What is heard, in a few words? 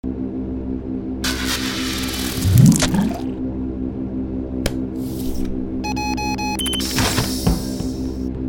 Bone melt; The Henry Stickmin Collection